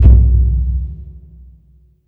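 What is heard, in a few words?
Burundi drum, Heart of Africa; Spectrasonics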